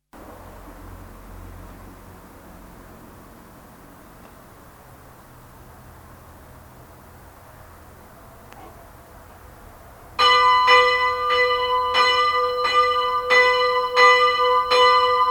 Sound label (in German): Glocken